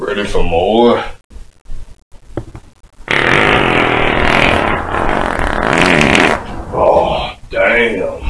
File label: fart on dog